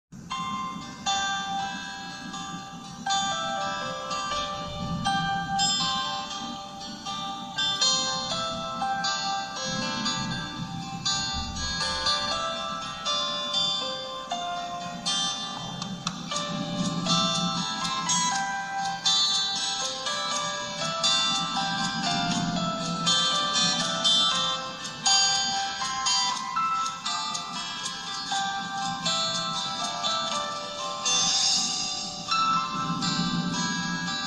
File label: Oppo Find X5 Lite Ringtone